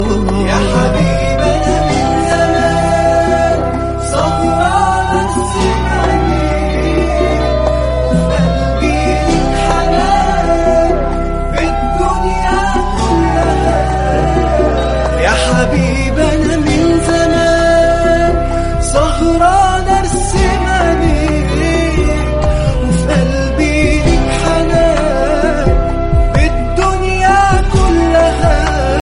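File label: madrasii guitar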